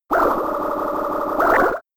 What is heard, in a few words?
Bubble Beam; Bubble Beam part 1